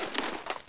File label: saw inspect finish, weapons